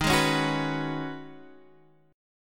Db 7th Suspended 2nd Suspended 4th

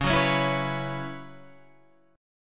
Gb 7th Suspended 4th Db, chord

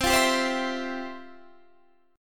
F Major 7th Suspended 2nd, C, guitar

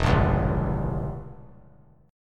E Diminished 7th E; Edim7 strummed; JGuitar

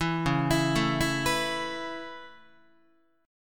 Db Minor 7th Sharp 5th Db Guitar; arpeggiated; 4A,4E,5A,5D,5G#,6C#

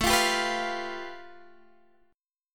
A 7th Suspended 2nd Sharp 5th, Guitar, Standard; A7sus2#5 strummed; JGuitar

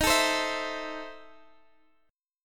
E Major 7th Suspended 4th Sharp 5th D sharp

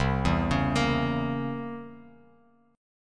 C 7th Flat 9th C down Bass Standard; C7b9 arpeggiated; JGuitar

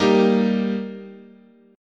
G Minor Sharp 5th, chord, guitar

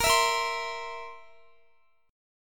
Bb Suspended 2nd Flat 5th Bb down Guitar; Bbsus2b5 strummed

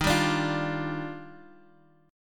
D sharp Major 9th, Guitar, Open G; 13,12,12,10,x,x; chordshape

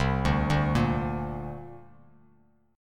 C 7th Suspended 2nd, C, down, Bass Standard; C7sus2 arpeggiated; JGuitar

